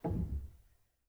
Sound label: rel, Grand Piano; bitKlavier Grand; Piano Bar